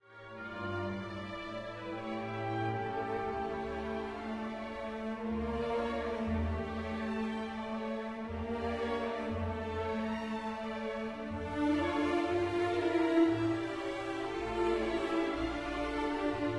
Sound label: chaconne; raff